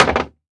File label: Impact Wood Pole Drop On Wood Floor; Impacts